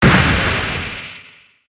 explode

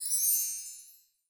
Gem sound

